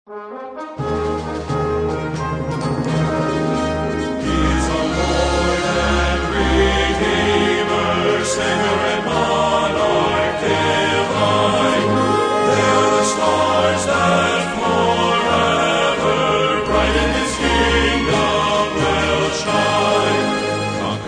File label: Trio, Victory through Grace